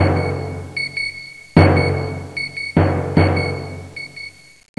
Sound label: beep beep; quake2